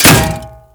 Bullet Impact Metal No Whizz; BulletImpacts; DE; Warframe

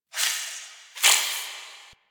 Interior Hiss; Ambience; Misc Indoor; DE; Warframe; Infested Eris

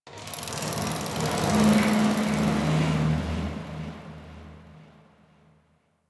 Ship Metal Movement Texture Large, Ambience; Warframe; Corpus Ship Remaster

